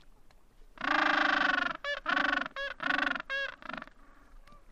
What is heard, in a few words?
Gentoo Ecstatic Display; Booth Island